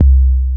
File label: Not Nice 808's